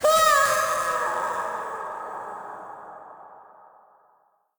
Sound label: death; Crypt of the NecroDancer